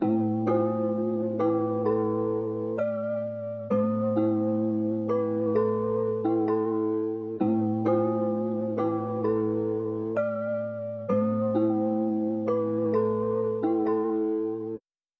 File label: Bells, 130 BPM A Min; Melodies; Loop Stems; Melody Mystery Expansion; Smoke